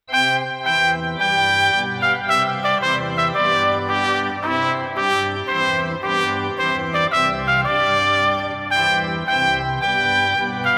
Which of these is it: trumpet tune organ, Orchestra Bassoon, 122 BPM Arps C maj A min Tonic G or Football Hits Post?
trumpet tune organ